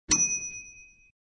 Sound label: Elevator Arrive; Bob the Robber 2